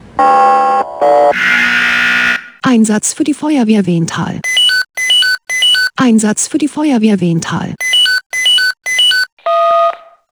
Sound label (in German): SMS Alarmierungstone; Wehntal